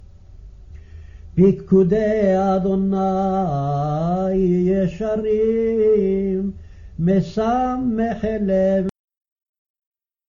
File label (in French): refrain chanté